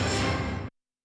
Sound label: HIT, Yamaha SY85 Drums